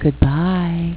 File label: game over; pang